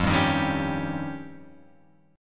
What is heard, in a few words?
F 7th Suspended 2nd Sharp 5th, Guitar Open F; strummed; chord; F7sus2#5; JGuitar